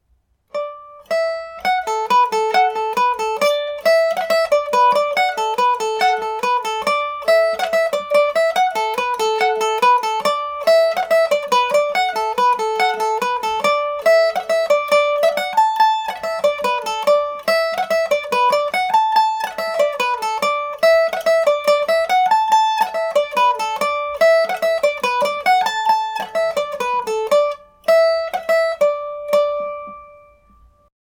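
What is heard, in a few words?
D major md304, irish mandolin; egans polka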